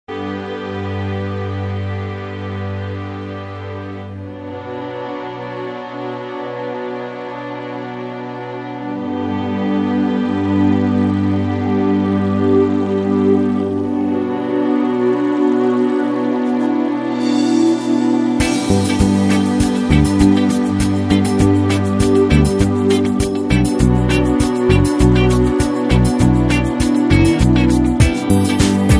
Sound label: Ambient Chillout Ringtones